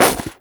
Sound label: soap bottle, crushables; oneshot; Half Life Alyx